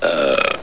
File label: fall; openffa; fat